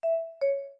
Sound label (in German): Kuckuck